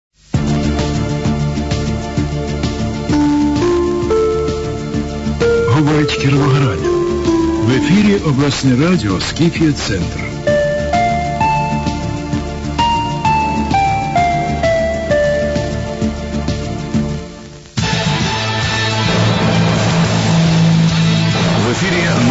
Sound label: interval signal; ur kirovohrad scythia